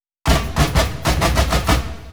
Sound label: Fill 128 BPM; Vengeance Samples; VEH1 Loops